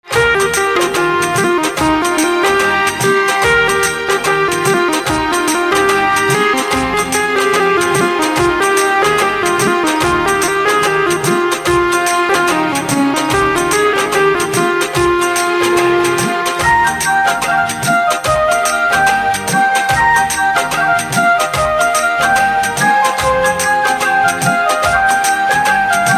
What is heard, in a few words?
Been and Organ